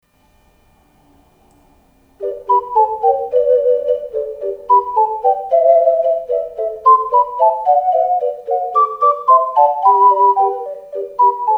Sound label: jingle, ocarina